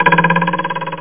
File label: TWANG